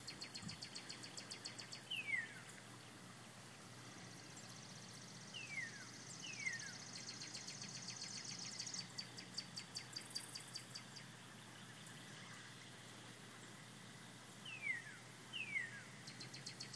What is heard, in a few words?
cardinal song